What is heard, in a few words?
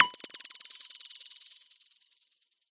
click n shiver; Electro and Synthetic